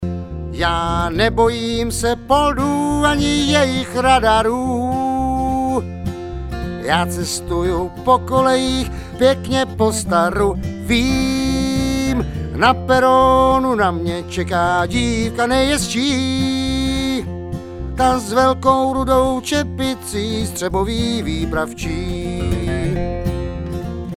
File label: vocLead, bluegrass